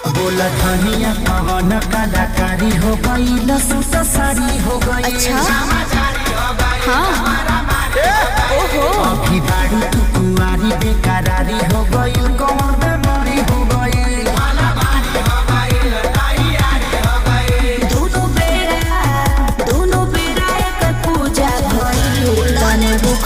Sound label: BHOJPURI SONG RINGTONE